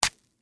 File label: Stab Apple Hit, Texture, Stab, Apple, Hit, Fruit; Food; Household